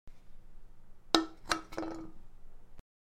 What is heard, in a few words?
Setting down empty can